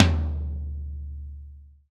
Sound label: TOM TOM, Real